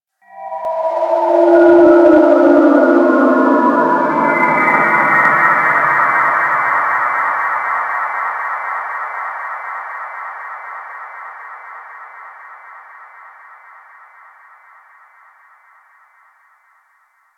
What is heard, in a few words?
Sector Wars Rand Amb, Ambience; Warframe; Tenno